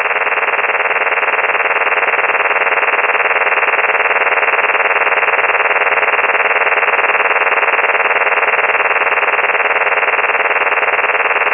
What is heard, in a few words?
OFDM 51